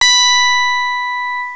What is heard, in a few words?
signal; Outrun; Arcade